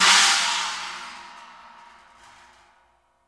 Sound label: PANG, cymbals; Sampleheads; New York City Drumworks VOL-1